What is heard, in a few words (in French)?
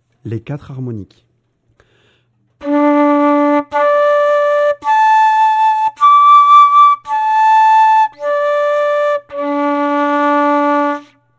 harmoniques, irish flute